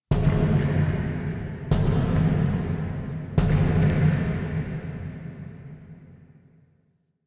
Misc Knocks Large; Warframe; Ambience; Entrati

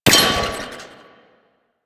Glyph Target Hit; Warframe; Duviri; Glyph Puzzle

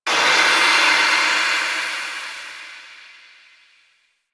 Steam Blast; Ambience; Warframe; The New War; Quests; Zariman

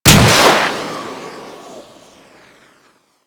Pet Rocket Fire; Abilities

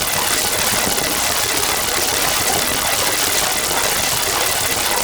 Railjack Pluto Charon Laser Proj; Warframe; Ambience; Pluto Charon Region

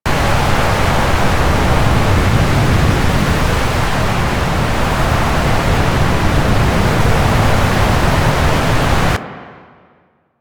Camp River Far Loop; Warframe; Quests; TheNewWar; Ambience; Drifter Camp; Water

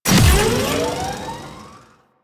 Mech Run Start; Nechro Mech; Vehicles

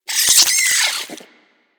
vocal death, critters; Warframe; Hunting Critters; Nexifera

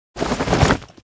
APC Jump Off Front Right; Enemies; Lasrian; Warframe

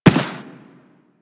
Run Default, Footsteps; Warframe; Teshin

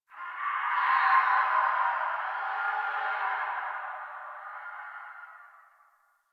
Distant Infested Screams Winter; Warframe; Ambience; Nineteen Ninety Nine

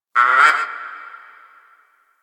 Forest Creature Mid Far; Warframe; Grineer Forest

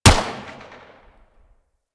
Pistol Shot, Weapons; Harlequin; Warframe; Tenno

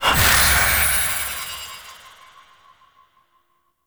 Puzzle Confetti Burst; Warframe; Ambience; Duviri; Bombastine Encounter

